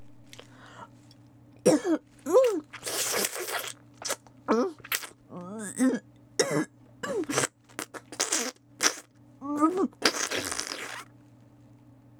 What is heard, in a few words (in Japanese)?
嗚咽あり喉奥フェラ; 特典エロ台詞